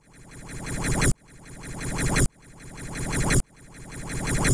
DROWN; needleman

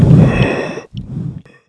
gurp; cobra

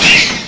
bump; alien

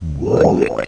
gurp

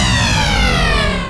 sc cool, sonic; zaero; weapons

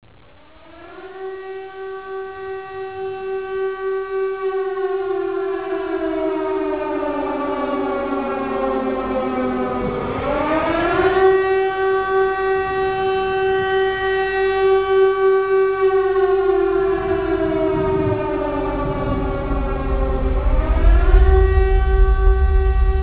alarm

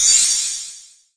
rare

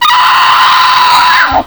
death; opentdm; Bug

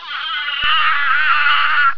pain; ornithomimus

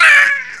death; unit01

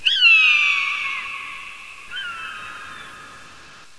hawk

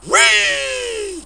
jump; openffa; homer simpson

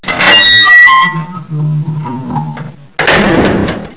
bulkhead

